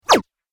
Laser Short And To The Point; Laser Sound; Laser Sound Sound Effects; Andrew Fleming

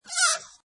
Cartoon Balloon Funny Squawk, Squeak

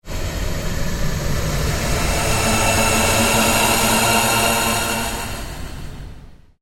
PULSE LONG SWEEP

